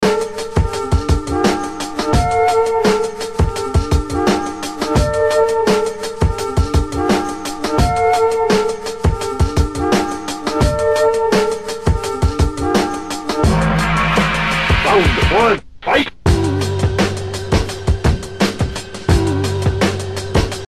Break

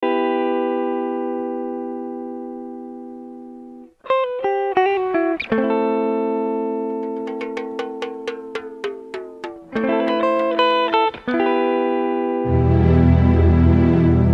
Break

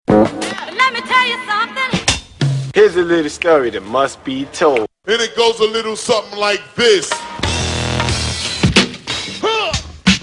Scratch tools

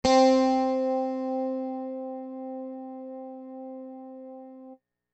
Coil Chors

